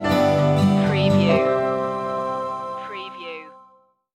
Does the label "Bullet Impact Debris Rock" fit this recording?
No